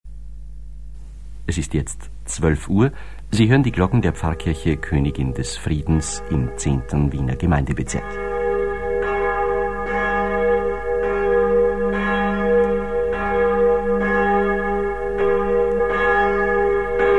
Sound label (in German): GLOCKEN